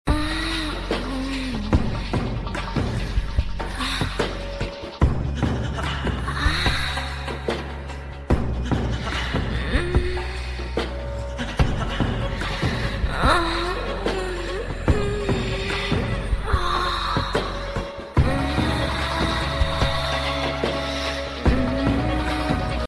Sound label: ring tune ringtone